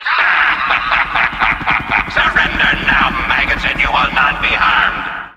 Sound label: helicopter spawn